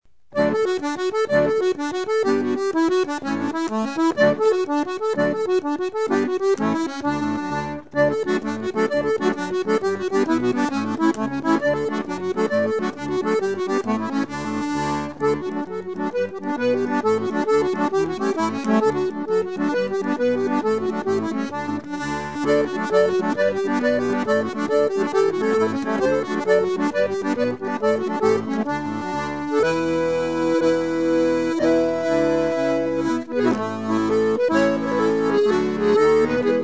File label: jig, akkordeonist